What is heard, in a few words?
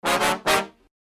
brass loop